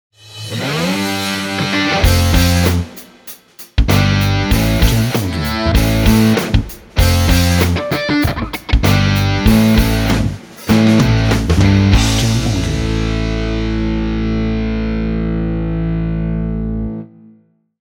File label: Rock Hard Intro Logo